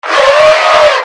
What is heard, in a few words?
fear die